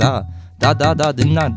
INDIA R, Heart of Asia, IND ENS

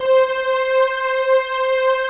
GLASS PAN, pads; Pro-Rec - Synthsonic

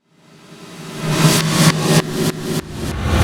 DRUM FILL; DRM FILL KIT; AKAI

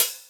Open Hhat, HiHat; Equipped Music; Loungin House

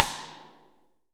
RIM, RFRM SN; Best Service; Real Mega Drums VOL-1

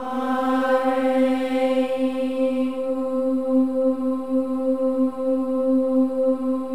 AH EH, Voices; Spectrasonics; Symphony of Voices; AH-EH-EE

